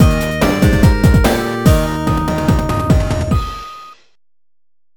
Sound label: Victory Fanfare; ravva and the phantom library